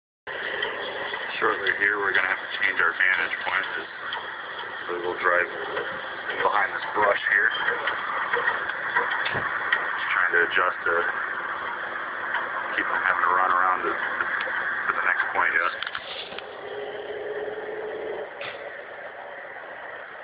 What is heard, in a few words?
noise of the drive